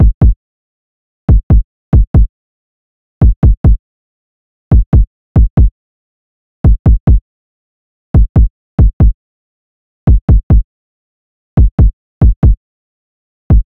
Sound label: Drum Loop 140 BPM Kick; Loop Stems; Cymatics; DOPE DRUMS VOL. 1; Mind Games